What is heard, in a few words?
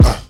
Kick